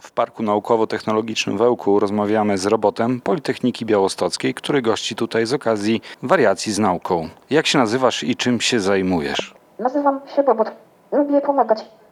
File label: techno